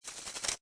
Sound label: Cartoon Fast Shake, Object or fan, rattle, shake; Comedy/Cartoon FAST SHAKE 02: Object or fan, rattle, shake, shaking